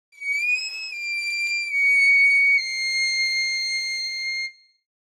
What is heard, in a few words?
Cartoon fail